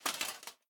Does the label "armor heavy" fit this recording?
Yes